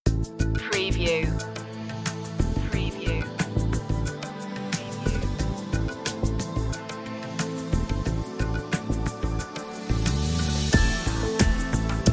down on the river no vocal

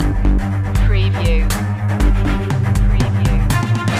tension seeker loop fill

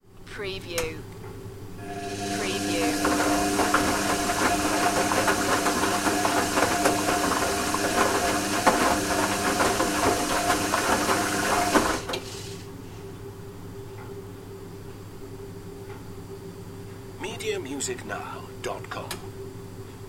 washing machine

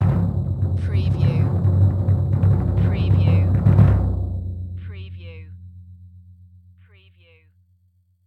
Cartoon Kick Drum Drum Roll

